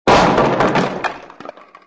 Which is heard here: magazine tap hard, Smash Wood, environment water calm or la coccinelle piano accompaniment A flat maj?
Smash Wood